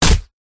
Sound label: human punch smack hit; zapsplat